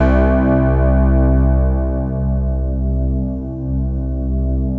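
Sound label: keyboard PAD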